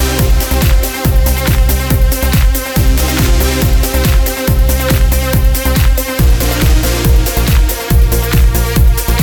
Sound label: dyn